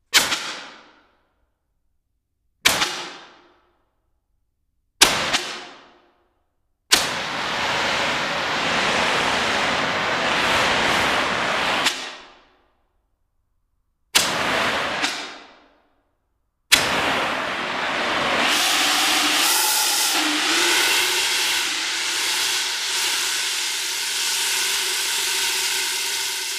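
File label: Fire Extinguisher Burst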